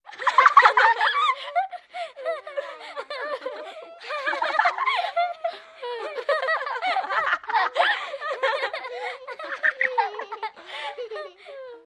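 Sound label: Children Small Group Laugh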